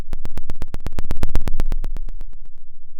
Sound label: Sound of a dinosaur